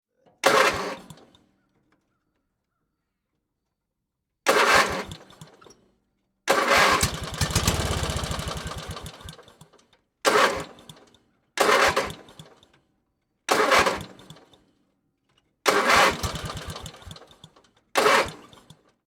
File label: motor false start